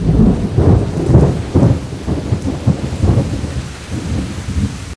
Orage roulement Pluie moyenne; Orages

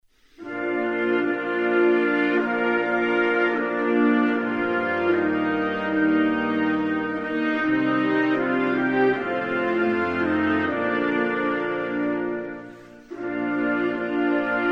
Luxembourg, Hymnes nationaux

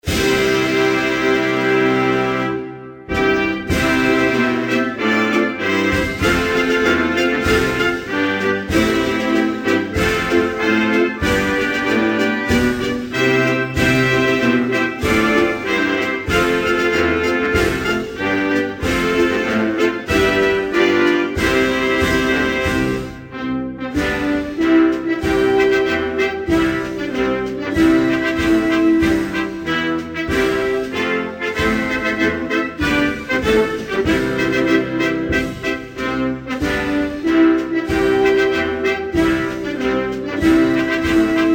Ethiopie, Hymnes nationaux

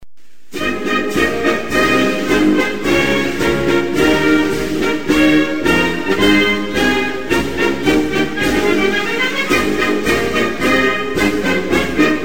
Venezuela, Hymnes nationaux

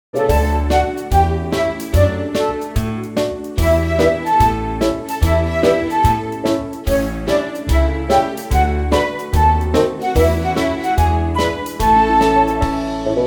Enfant histoire jingle; Jingles medley

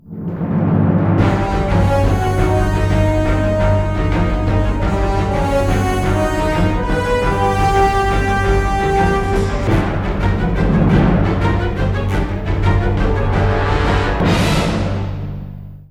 Agent secret jingle; Jingles medley

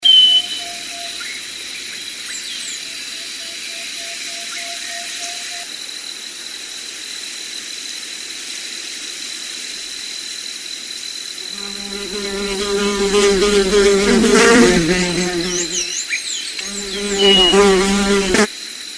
Campagne jour ete oiseaux insectes

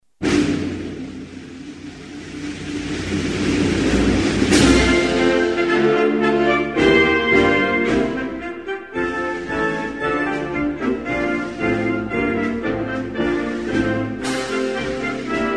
Singapour, Hymnes nationaux

